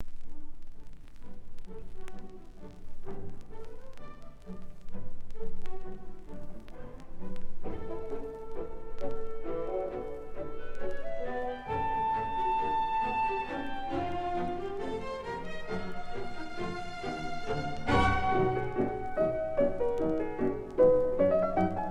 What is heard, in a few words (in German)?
Konzert Für Klavier Und Orchester Nr. 5 G-dur; Op.55; 5. Satz; Vivo